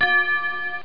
BELL; PBALL

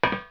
metal walk; hereticiidemo; player

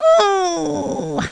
Goose Hug

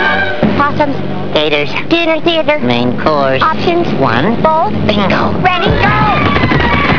bolt

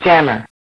jammer; Falcon4

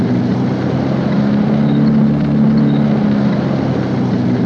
track lp; sf demo

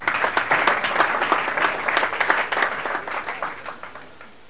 crowd

